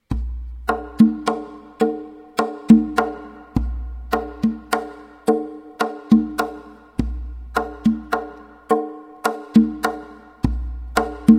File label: tonbak